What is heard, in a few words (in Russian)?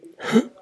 прыжок; KOJIO6OK